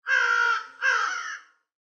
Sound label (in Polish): KRUK; Voices